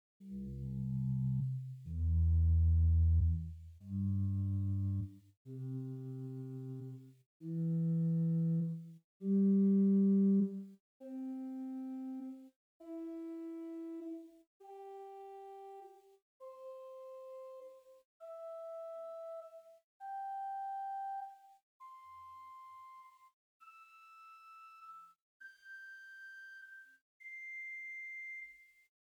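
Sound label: Swell Erzahler 8 arpeggio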